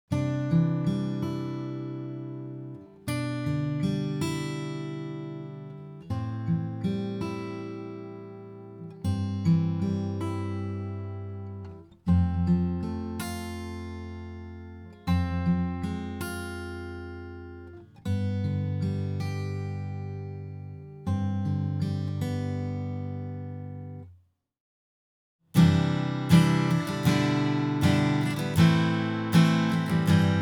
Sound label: guitar; アコギC